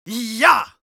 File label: Yells, Viking Vocal